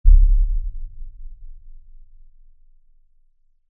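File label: Impact Low Frequency Effects Monster, Footstep, Tremor; Impact, Lfe, Monster, Footstep, Tremor; Horror; Blastwave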